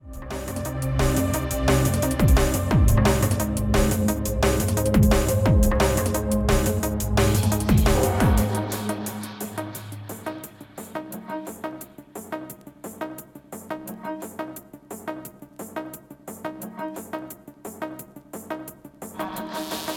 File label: strobing snare beat broken